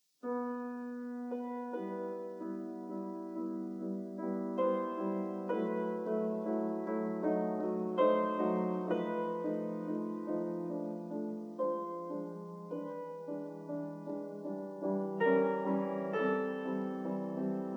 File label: Prelude in E minor; F. Chopin; 24 Preludes, Op 28